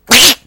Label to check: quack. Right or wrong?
Right